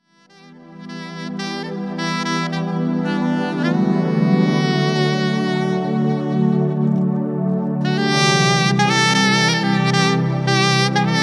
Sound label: ambiant Chill